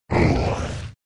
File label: Boss Dead